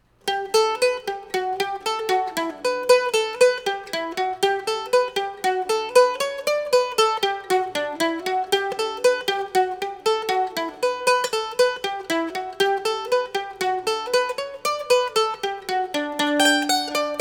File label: banjo